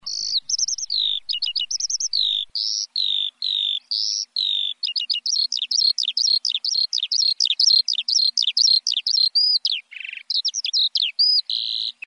alouette cri

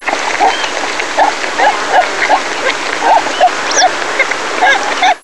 phacochere cri

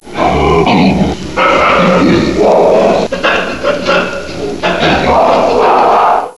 mandrill cri

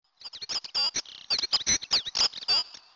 accenteur cri

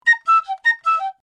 piccolo son

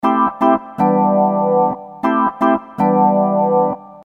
orgue son

